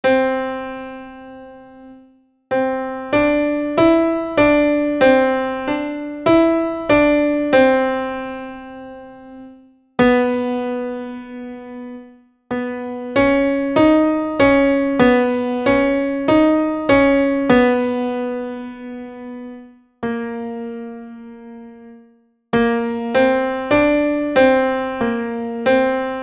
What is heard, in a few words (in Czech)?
plny klavir